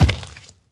僵尸疣猪兽脚步声

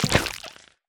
蜂蜜块踩踏声

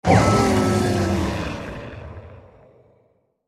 死亡声; 监守者

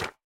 树脂砖放置声

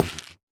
下界木悬挂告示牌踩踏声

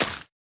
湿草 挖掘声

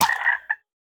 青蛙死亡声

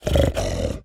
猪灵蛮兵待机声

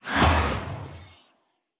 试炼刷怪笼生成声

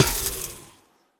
试炼刷怪笼破坏声

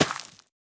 收获作物声

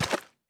炽足兽脚步声

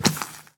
尸壳脚步声

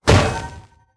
重锤空中重击声

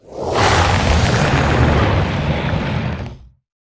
咆哮声; 监守者

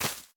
洞穴藤蔓破坏声; ambient

